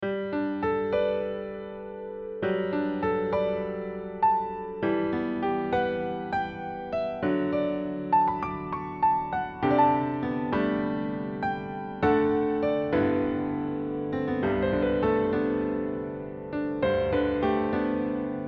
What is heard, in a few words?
Waterfall Film Score